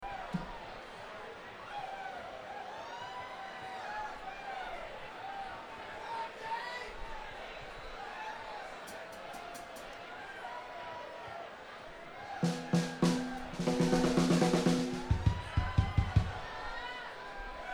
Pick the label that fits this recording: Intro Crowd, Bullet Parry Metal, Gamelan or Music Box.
Intro Crowd